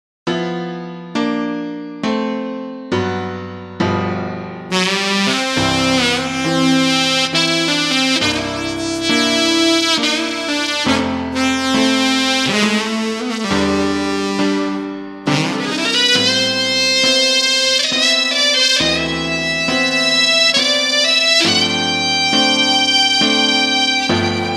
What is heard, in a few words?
Tenor Sax